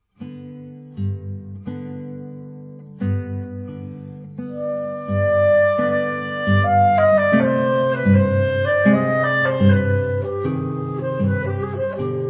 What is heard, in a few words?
ROMANIAN FOLK DANCES SUITE; PER CLARINETTO E CHITARRA